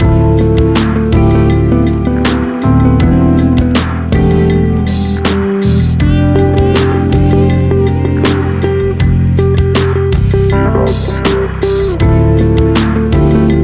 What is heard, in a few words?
Hint; Hollywood Files Deadly Intrigues; NDS